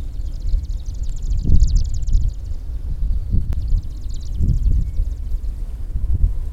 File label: grassbird marsh Locustella pryeri